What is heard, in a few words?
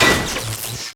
electric panel, bullet hit; projectiles; Max Payne